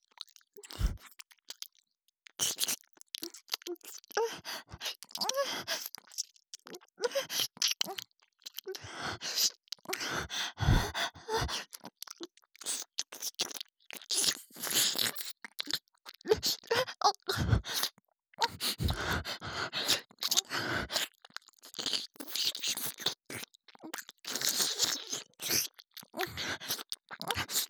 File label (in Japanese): ときおり感じながらの吐息多めの耳舐め